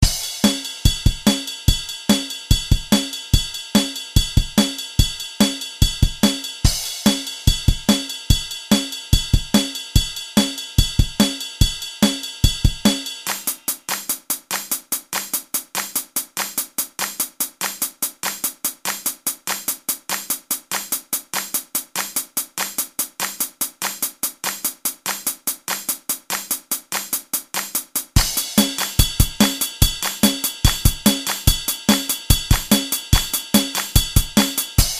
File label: poly rythm